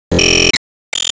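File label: Battle Vs Mode Out; disney star smash android ios mobile gamerip 2020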